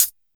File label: smooth closed hi hat sound A key, Royality free high-hat sound tuned to the A note